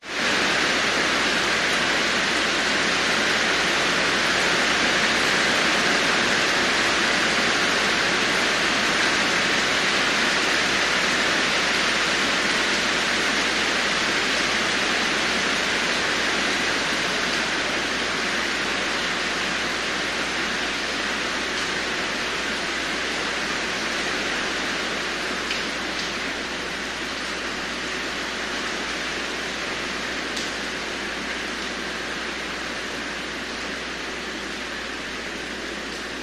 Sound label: nature rain heavy loud roof house internal windows open rain calms down quickly